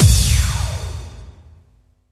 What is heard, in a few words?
Impact; Vengeance essential club sounds-3